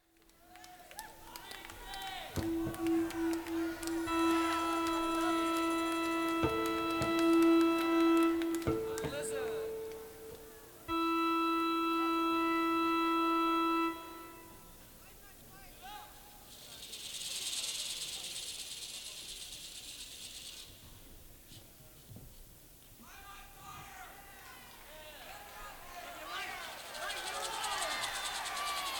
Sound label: Tuning